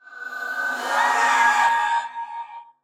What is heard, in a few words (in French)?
Banshee Cri; Phasmophobia